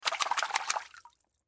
Splash Razor Clean, Water Splash Razor Clean Household Bathroom Sink Movement Fast; Splash Sound Effects; Splashes; Blastwave